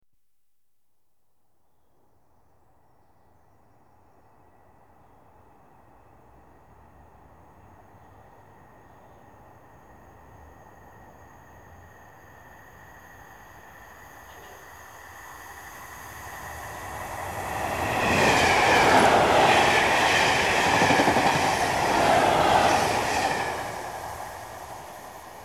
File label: Trains Passing Commuter electr; The BBC Sound Effects Library